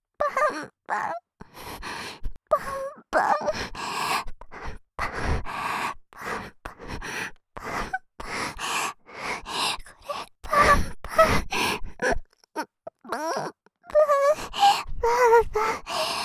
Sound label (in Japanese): ぱんぱん, 右耳; ASMR